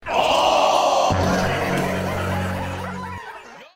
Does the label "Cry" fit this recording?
No